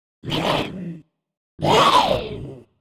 Attack

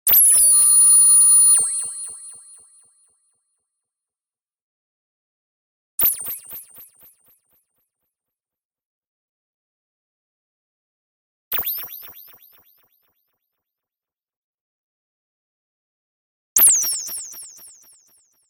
Magic Wand

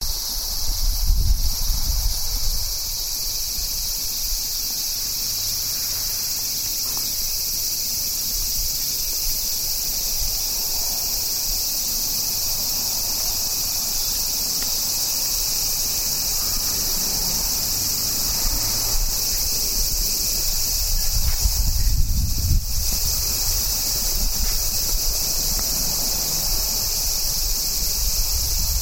Cicada In Japanese Forest

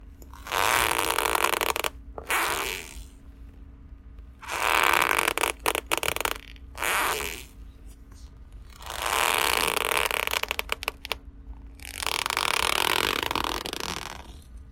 Slow Leather Stretch

